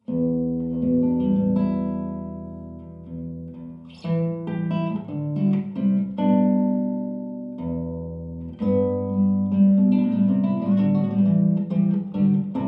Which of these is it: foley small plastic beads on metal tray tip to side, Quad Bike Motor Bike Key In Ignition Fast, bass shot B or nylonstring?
nylonstring